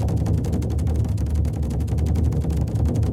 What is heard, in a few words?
african drum roll low; serengetikings